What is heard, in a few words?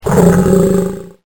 lion roar